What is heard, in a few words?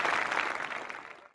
appluase; 2fast2curious; boss card memory spellingbee appluase everytime you make match